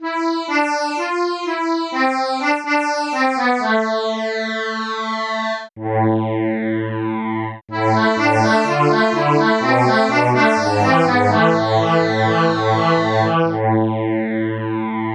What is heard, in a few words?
accordian